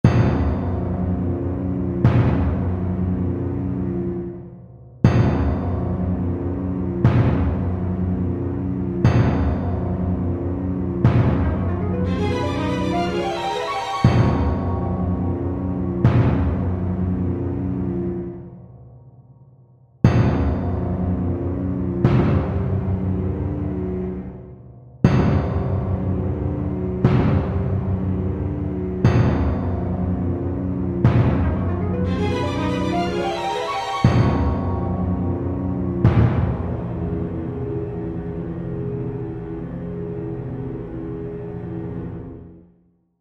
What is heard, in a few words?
Composition Orchestral Hits Arpeggios Dread; Musical; Blastwave